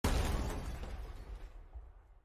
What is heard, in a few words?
crash